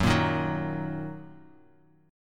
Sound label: Fsharp 6th down, chord